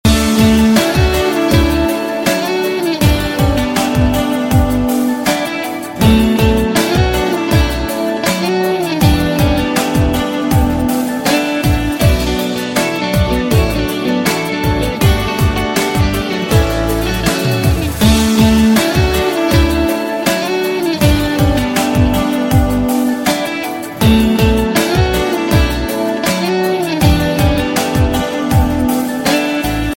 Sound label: guitar